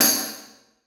tambourine hit, Percussion; Sonatina Symphonic Orchestra

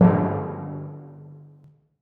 timpani f#1, Percussion; Sonatina Symphonic Orchestra

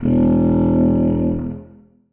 contrabassoon e1; Sonatina Symphonic Orchestra

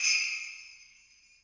sleigh bells, Percussion; Sonatina Symphonic Orchestra

